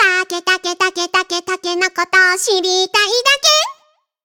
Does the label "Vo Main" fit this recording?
Yes